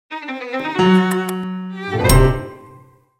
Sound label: Christmas bumper